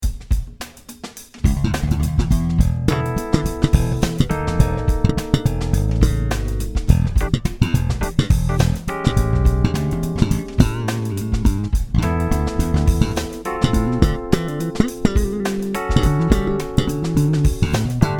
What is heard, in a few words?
Slap; Fclef 5 string Vintage ‘70